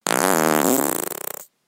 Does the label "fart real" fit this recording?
Yes